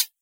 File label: hit; DanmakuProject